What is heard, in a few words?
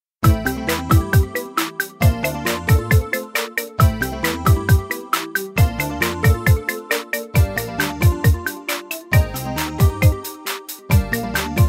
marble techno